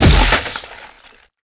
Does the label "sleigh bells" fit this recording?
No